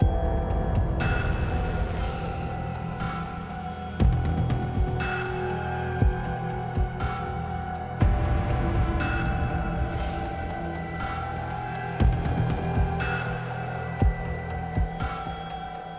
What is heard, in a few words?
Mood Tension Medium